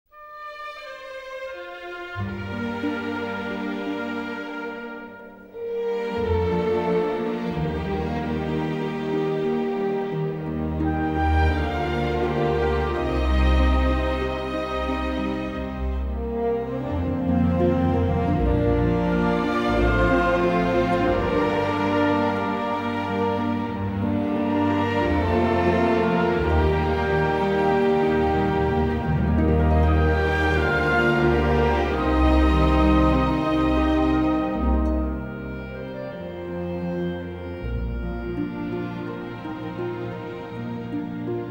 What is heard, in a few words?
Instrumental